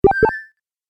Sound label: Classic Lo-fi Magic Collect, video game sound effects